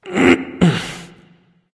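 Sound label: grunt lift, male; smite; voicepack; rama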